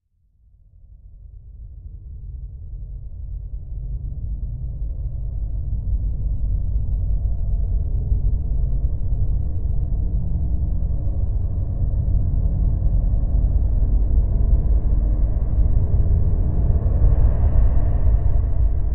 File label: Bed Approach Danger